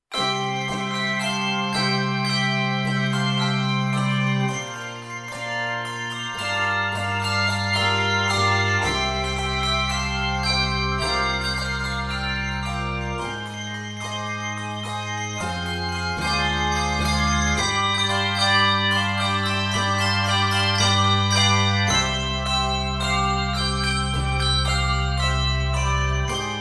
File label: Ode to Joy bells only